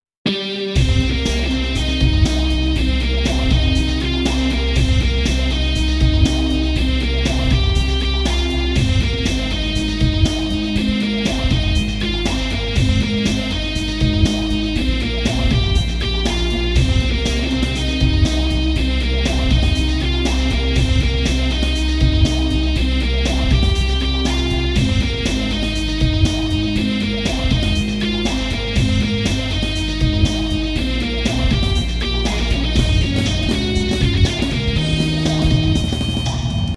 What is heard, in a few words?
guit; otenba